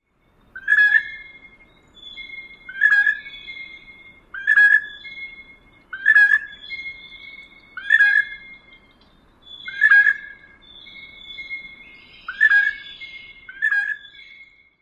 piping notes; NY

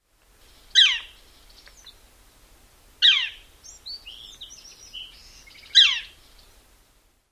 peough red-shafted; CA

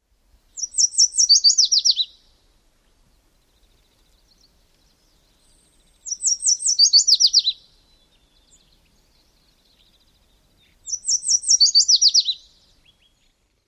songs, YELWAR

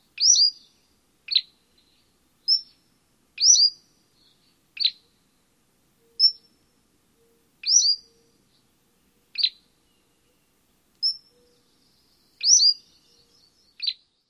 dawn song

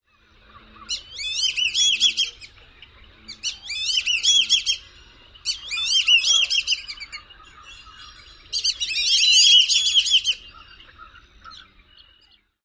wheezy songs

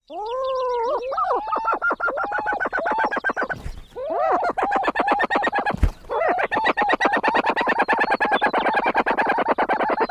lek calls

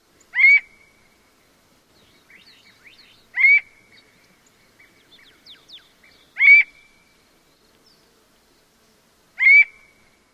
queee song; TX

